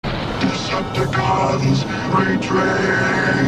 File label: retreat soundwave